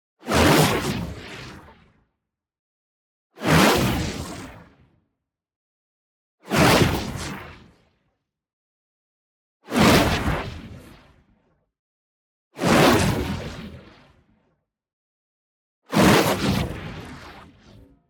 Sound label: WHOOSH SMALL Chaotic Orb; boom library; Matter